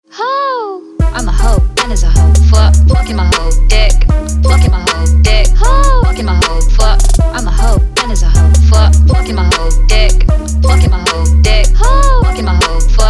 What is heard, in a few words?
Trap